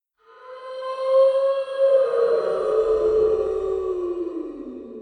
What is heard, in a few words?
ploof